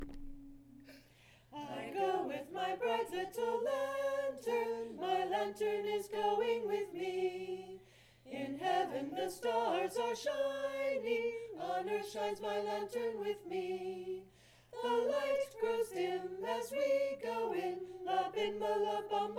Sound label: lantern walk, i go with my bright little lantern